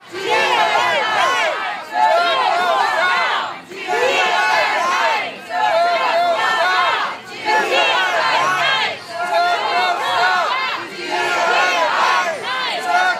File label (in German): demonstration gegen zirkustiere; zirkus probst